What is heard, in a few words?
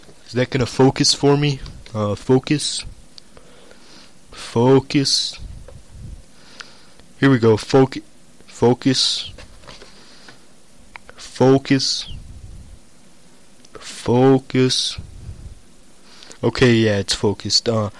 focus full